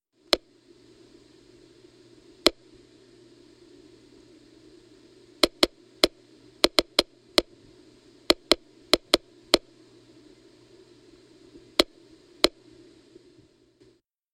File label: Smart Meter